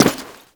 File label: Step Default; Characters; Warframe; The New War; Veso